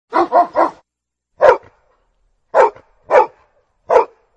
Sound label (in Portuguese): Vinheta Cachorro Latindo; Brega; Zuacao